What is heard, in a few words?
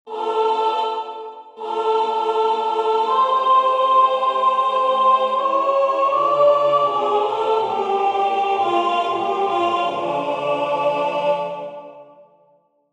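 soprano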